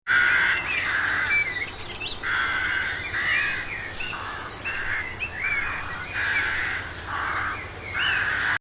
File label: Flock of crows in a wood with other bird noises; Birds; Animals